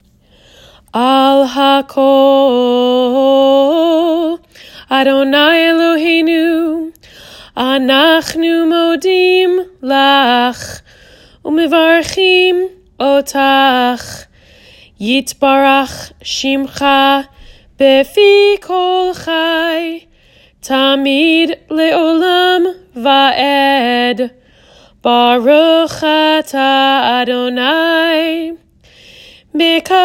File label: After blessing